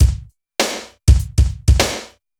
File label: Ambience, BPM100; Ambience Loop; AKAI S6000 CD-ROM - Volume 4